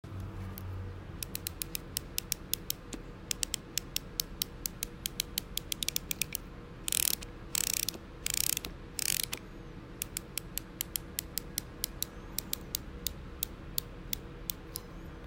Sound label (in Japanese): ラチェット音